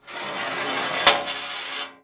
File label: Coin On Metal Tray